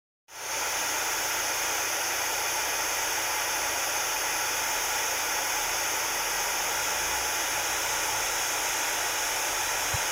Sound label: Pink Noise